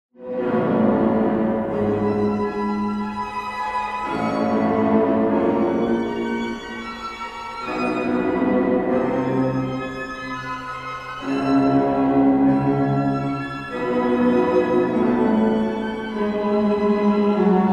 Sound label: Vivaldi Spring; Brisbane based string ensemble